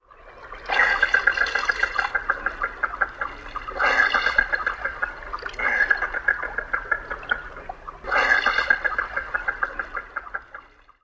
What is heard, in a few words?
other male calls